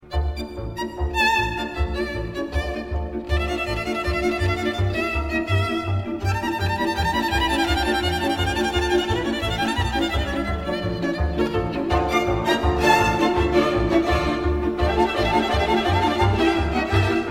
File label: hungarian dance violin; Electronica; Johannes Brahms